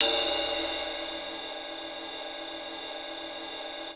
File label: CYMB; Houseworx; Drums; Cymbal